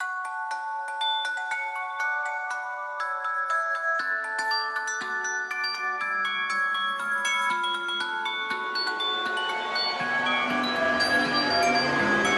Cinematic Fairy Tale Trailer Celesta And Strings Without Effects